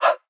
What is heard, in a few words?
Chihuahua Vox; trap; Vocals; Cymatics; Trap Starter Pack